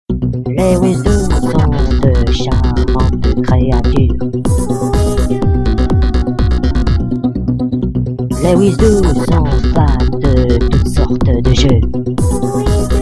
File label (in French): hymne